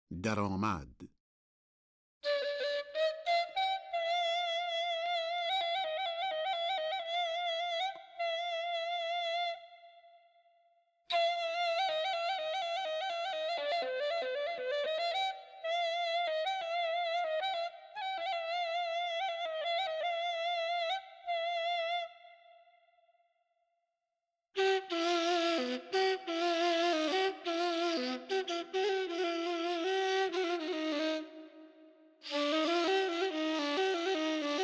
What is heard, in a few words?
Daramad Bayat-e Tork Radif-e Ney; Avaz-e Bayat-e Tork